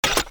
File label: laser minigun clip in